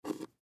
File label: Chap Stick Pick Up Table; Foley